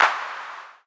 Clap

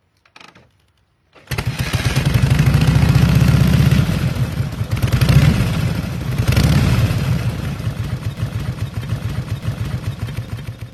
Motorcycle Harley Davidson Vintage

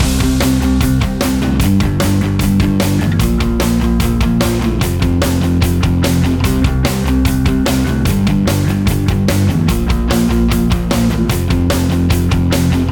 Pop Punk Loop

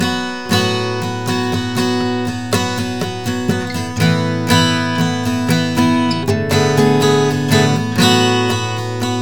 Acoustic Guitar Progression

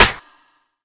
Ball Drop Snare Clap

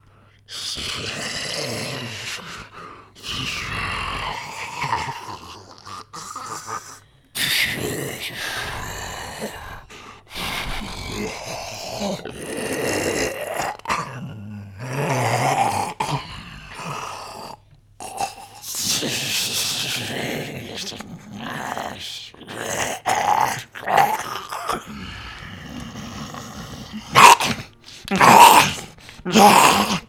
Zombie Grunt Roar

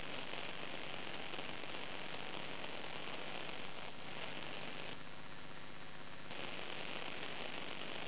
Electromagnetic Router Internet Loop Mono; Elektrousi

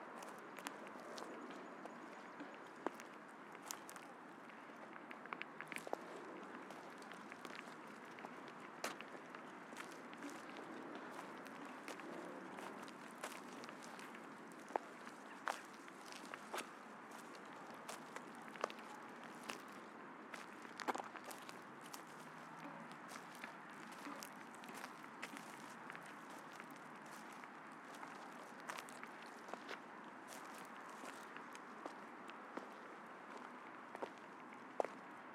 Footsteps Mulch Rocks City Park

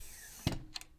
Chair Piston Moving Up

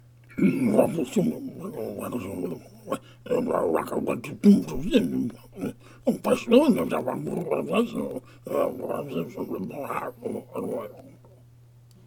Grumbling

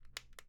Alarm Clock Turn Off

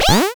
Pickup

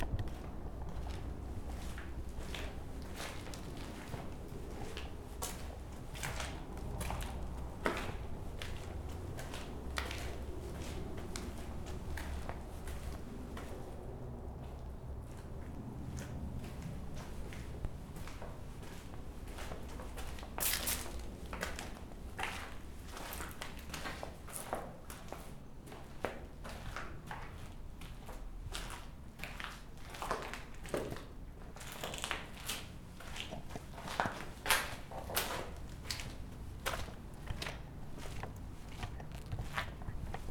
Walking Inside Abandoned Building

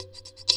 Djembe cheap mic session

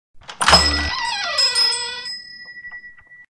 Shop Door Opens With Bell Rings

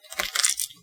Egg Crack

